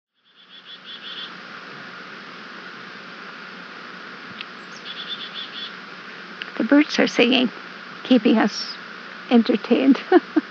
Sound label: Birds Are Singing